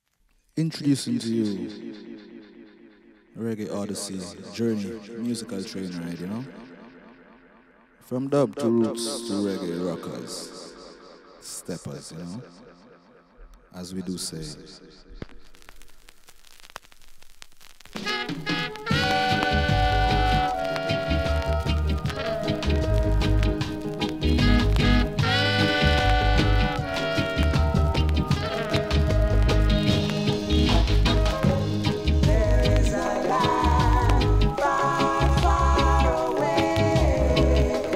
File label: Reggae from Dub to Dancehall; A Journey into Reggae from Dub to Dancehall